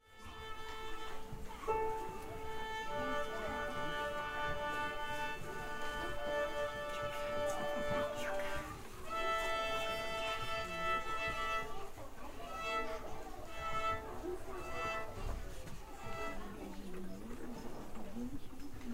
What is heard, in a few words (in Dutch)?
viool met pianobegeleiding